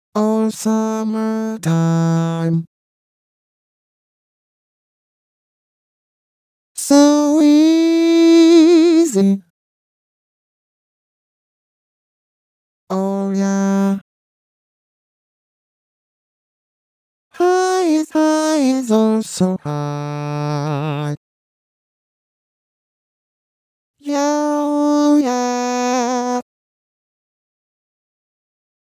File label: Back Middle Low (voice only), vocaloid